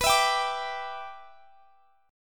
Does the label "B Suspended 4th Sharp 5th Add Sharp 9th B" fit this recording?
Yes